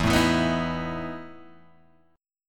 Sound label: E Major 7th Suspended 4th, Guitar, Open G